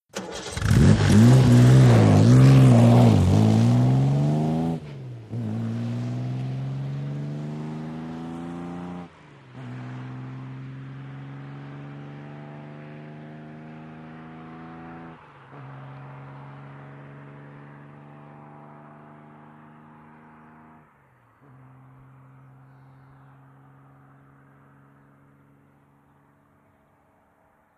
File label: VEHICLES ASTON MARTIN: EXT: Start, depart, fast, some gravel